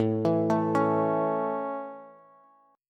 A Major, Bass; arpeggiated

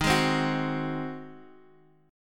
Ab 7th Eb

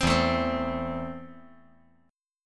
E Suspended 4th Sharp 5th

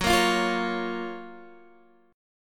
F sharp Major 7th F sharp, Guitar Open D; chordshape; down

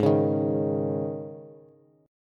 Gsharp Suspended 4th, Bass; strummed; x,11,11,8

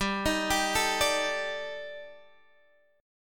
D sharp Major 7th Flat 5th G

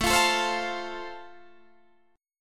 A Minor Sharp 5th A

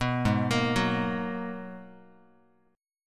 G sharp Minor 9th, Guitar; arpeggiated; 2,4,1,4,x,x; 4A,4E,5A,5D,5G#,6C#

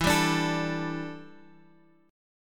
E Minor 11th guitar chord, Open F; Em11; strummed; x,x,4,2,5,4

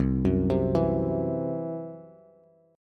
C Major Flat 5th, C, down, Bass

